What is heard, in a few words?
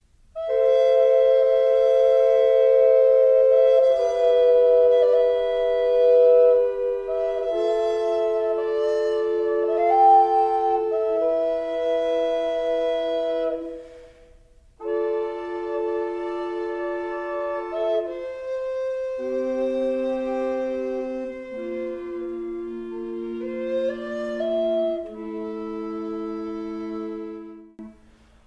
Sarabande; Salicet